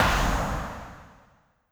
robot footstep long

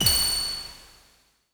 ice beam

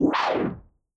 beast roar

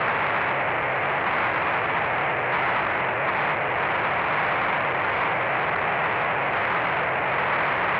synth noise loop

